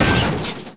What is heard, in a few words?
Crash; IHRA Motorsports